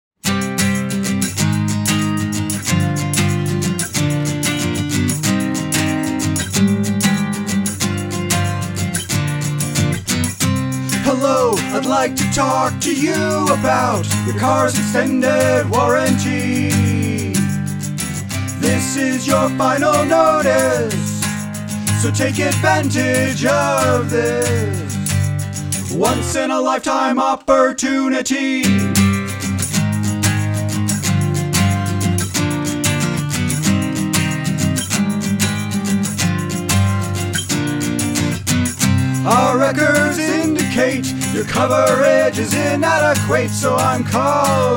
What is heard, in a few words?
beep